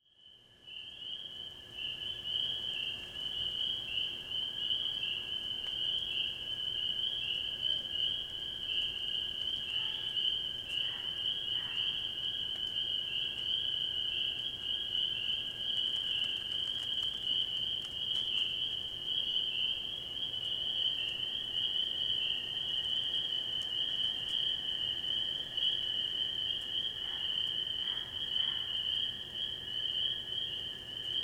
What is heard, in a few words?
Night Forest; Wisconsin